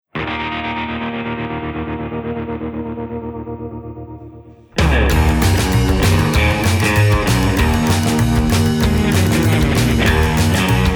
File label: Atlantic Surf, Rock n Roll